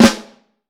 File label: FLAM, Snare Drum; Q Up Arts; Johnny.C's Vintage Snare Drum; 5X14 RODG